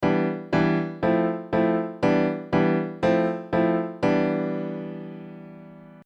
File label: Phrygian prog; Modal Harmony: An In-Depth How-To Guide